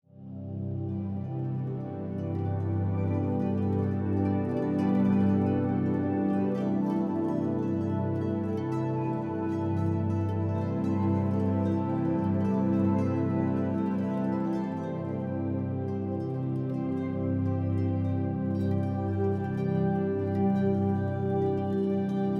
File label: ambient; chromium